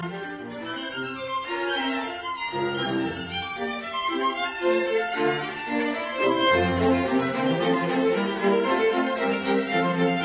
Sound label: String Quartet in F major Allegro; Beethoven; Op 59 No 1; Rasumovsky; Theme russe